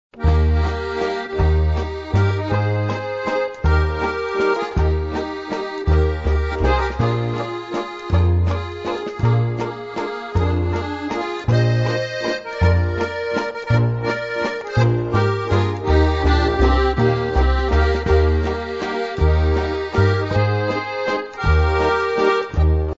waltz

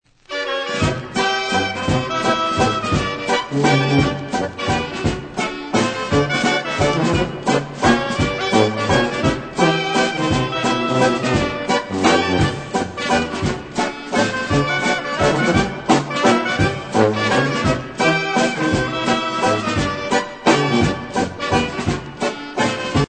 waltz

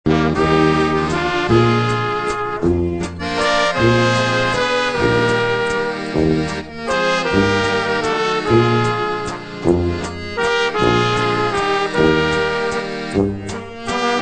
waltz

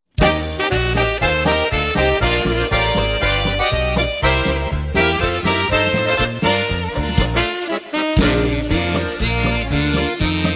POLKA

